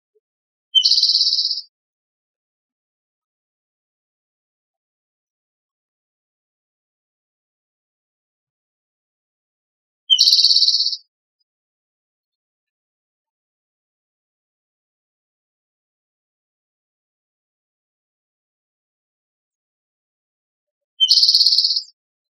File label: spotted (rufous-sided) towhee; Tigard OR